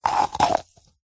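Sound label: foley coconut shell 2x halves empty scrape turn hollow medium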